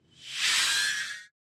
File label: Distorted Metal Scrape; SoundsCrate